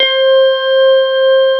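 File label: CX3 organ C5 R, Keyboards Old School; USB Soundscan vol.09 - Keyboards Old School; AKAI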